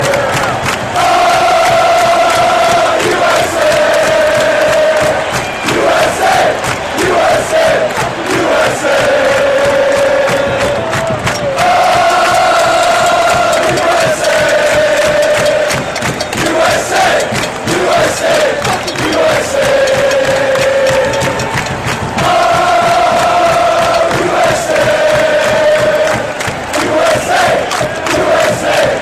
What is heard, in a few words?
Us Soccer Chant